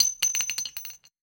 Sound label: weapon ammo drop; Stereo; Real Guns Sound Pack 2